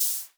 M500 HH, Drums; Best Service; Dance Mega Drums; MAESTRO

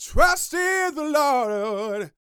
Vocal Gospel; Vocal Planet Vol1 Gospel

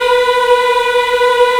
F B 4, CHOIR; Spectrasonics; Heart of Africa 1; CHOIR MMS

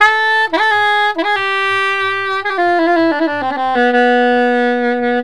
SAX

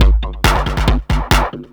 percussion loops, Techno; Techno ID

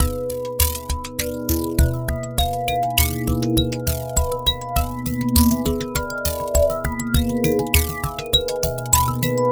SILICONE MARIMBA, ARPEGGIATOR DRUM MACHINE; Sonic Foundry (Sony Creative Software); Vir Unis Infusion

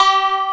YANG-CH'IN; AKAI S6000 CD-ROM - Volume 1

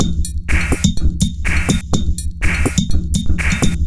Top C 124bpm, House Drumloops; Zero-G House Fabrik Drumloops

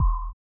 SONAR; Spectrasonics; Metamorphosis

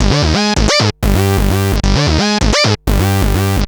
Analoop, Industrial Analog

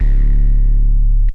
Grunge Nu, Basses; Drum & Bass; AMG - Prototype Drum & Bass

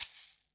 Shaker, EMU Orbit-3 drum machine; 300 Drum Machines

